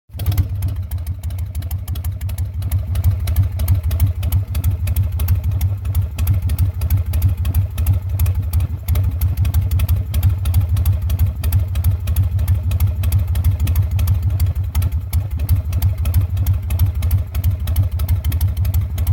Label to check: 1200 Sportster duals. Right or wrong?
Right